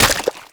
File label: Run Sludge, Footsteps; Warframe; Tenno